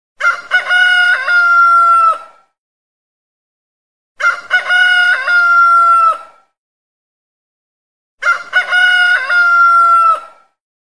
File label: Rooster Crowing Ringtone